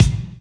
Deep Kicker, Kicks, Drum Hits; Loopmasters; Jon Carter & Alex Blanco; Block Party Essentials

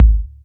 Kick

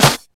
Claps & Snares

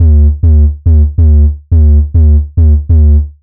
140 D BASSLINE, Dubstep; Loopmasters; The New Sound Of Dubstep; BASS LOOPS

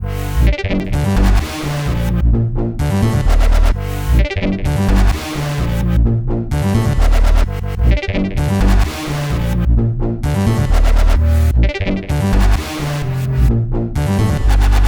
129 G Full Mix, Complex Electro Synths; Androids; Prime Loops

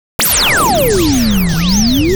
122 D#m Sfx, Complex Electro Synths; Prime Loops; Invader

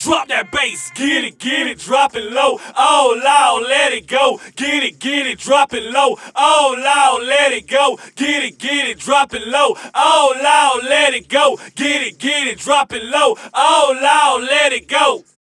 VOX

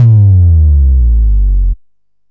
A# Drop The Bass Note; Loopmasters; Jon Carter & Alex Blanco; Block Party Essentials

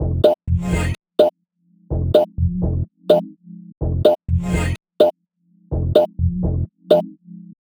126 F COMBI GROOVE, funky; Loopmasters; Funky Underground Vol 2; COMBI GROOVES

